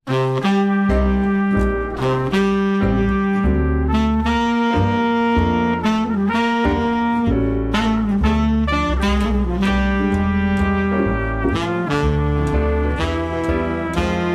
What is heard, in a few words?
jazz; jazz in the kitchen recording